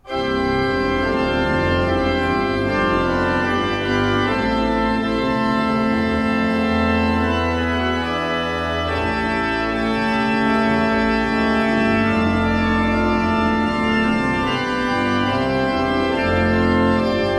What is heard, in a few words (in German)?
Euler II P, Orgel